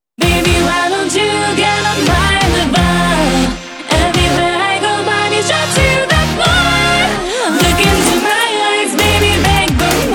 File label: hardcore